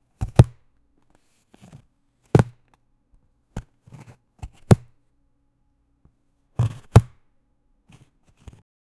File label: sound of a pizza cover, lid